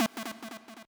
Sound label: sub lead a2